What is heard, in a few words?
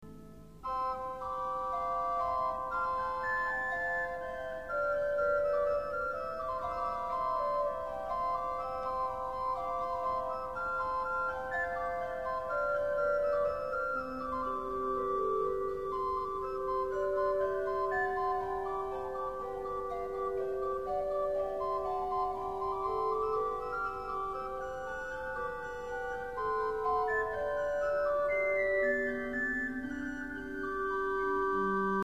organ